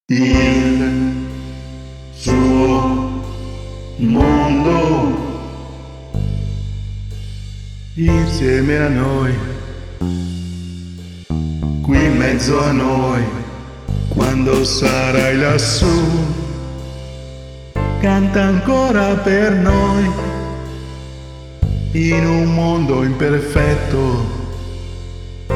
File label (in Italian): voice